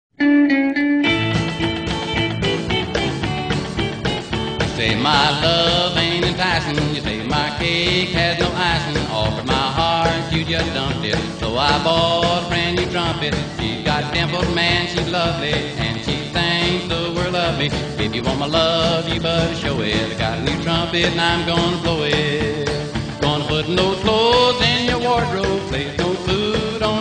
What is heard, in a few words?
Trumpet; roots of rockabilly